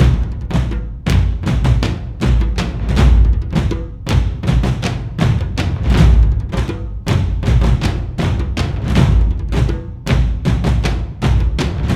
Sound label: Drums; For Honor